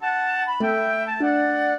minuet, flute harp; Mozart

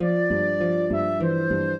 trio, flute harp; Mozart

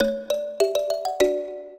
minuet, mbira; Mozart

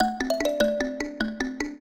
trio, mbira; Mozart

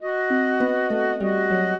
minuet, flute harp; Mozart

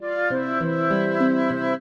trio, flute harp; Mozart

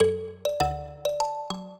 minuet, mbira; Mozart

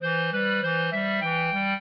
clarinet; Mozart

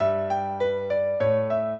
piano; Mozart

